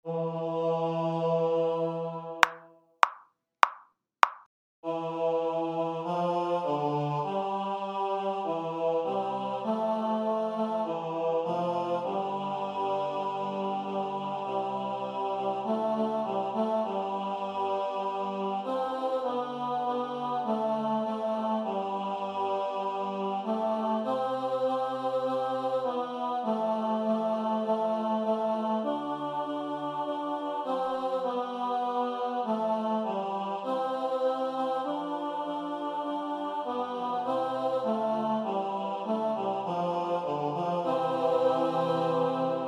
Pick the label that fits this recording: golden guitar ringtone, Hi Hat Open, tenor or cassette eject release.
tenor